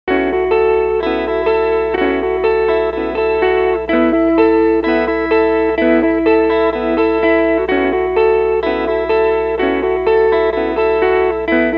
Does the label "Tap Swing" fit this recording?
Yes